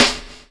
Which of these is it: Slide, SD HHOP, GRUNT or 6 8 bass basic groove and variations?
SD HHOP